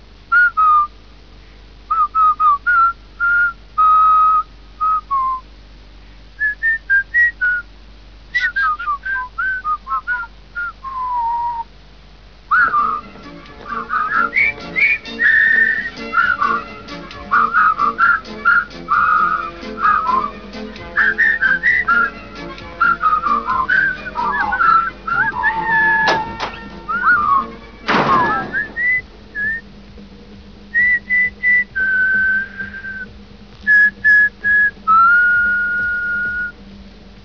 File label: whistle